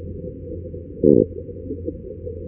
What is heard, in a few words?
Argyrosomus regius Juvenile Social Sound